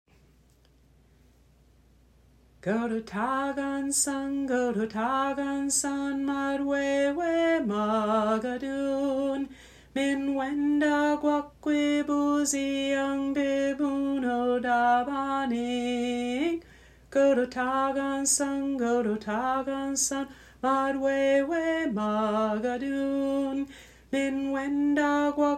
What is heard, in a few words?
Jingle Bells in Ojibwe